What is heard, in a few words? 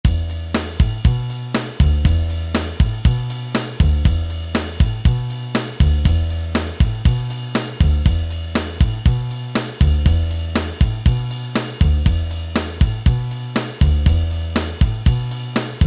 Rock